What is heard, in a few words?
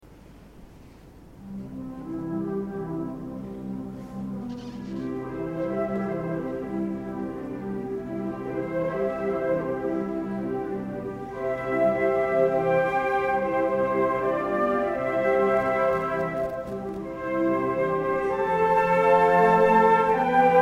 consert, flute; arietta-flute